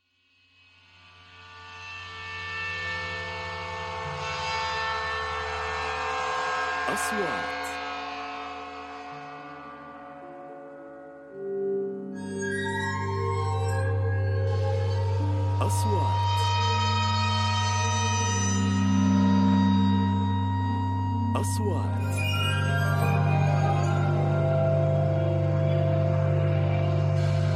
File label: Horror Full